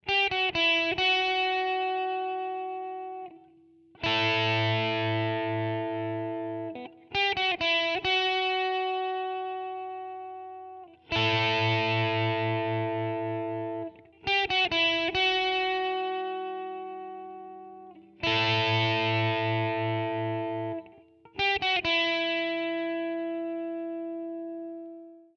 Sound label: Gtr Mono